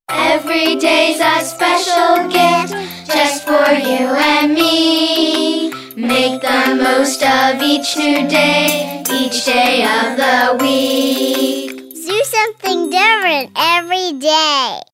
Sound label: Kid Song Toy Marimba; LouZoo